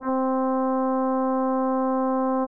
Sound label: bassoon c4